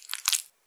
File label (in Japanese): 膣外射精